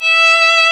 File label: ARCO VIOLIN, Arco Solo Violin